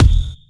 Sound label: present kick drum one shot e key, Royality free steel kick drum sample tuned to the E note